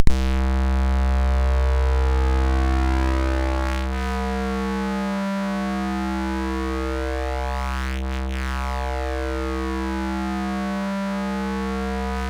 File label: X-Ray, MOS6581R3